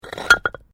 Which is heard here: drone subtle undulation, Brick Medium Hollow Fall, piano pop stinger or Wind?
Brick Medium Hollow Fall